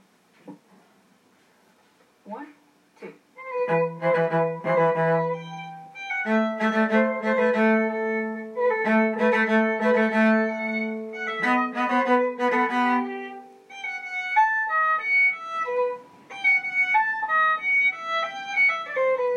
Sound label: beginner cello